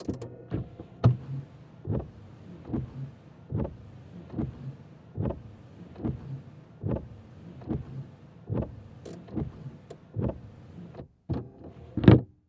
Transportation Car Monte Carlo Interior Windshield Wipers